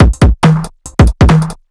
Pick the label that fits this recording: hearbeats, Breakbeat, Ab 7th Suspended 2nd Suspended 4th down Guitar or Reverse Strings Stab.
Breakbeat